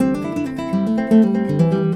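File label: SPANISH C, acoustic guitar 120 BPM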